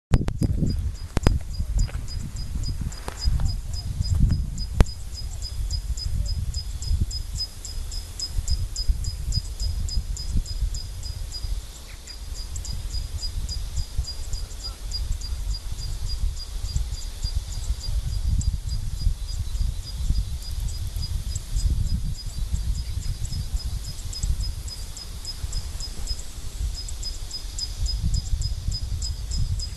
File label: Zitting Cisticola, Suncheon South Korea